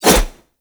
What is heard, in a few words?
Heavy Whoosh, Dual Daggers; Prime Okina